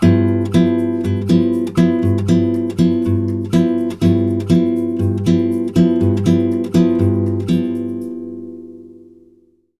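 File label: 120 guitar G minor 7, Brazil Bossa; USB Soundscan; AKAI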